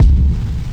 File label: Kick